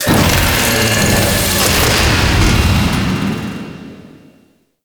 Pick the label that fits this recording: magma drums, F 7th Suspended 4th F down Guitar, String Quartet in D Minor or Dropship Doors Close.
Dropship Doors Close